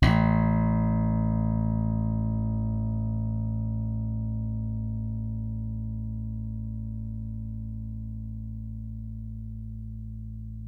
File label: pull